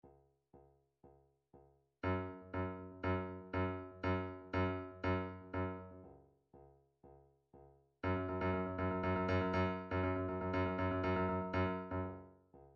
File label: drum trax hats